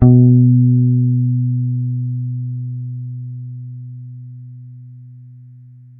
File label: Bass Low B, Electric Bass One Shots; Slate Digital BASSHOLE Sample Pack